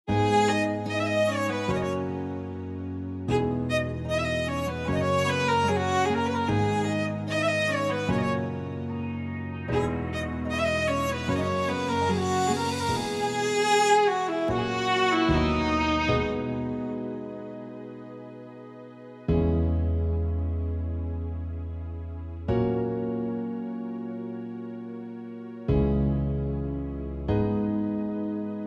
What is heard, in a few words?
Karaoke, 75 BPM; BasserMusic Studios